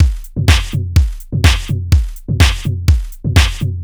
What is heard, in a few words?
Drums 125